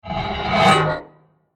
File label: Scary Spinning Metal Transition; Scary Soundscape; Horror; CraftedSignals